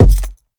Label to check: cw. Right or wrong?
Wrong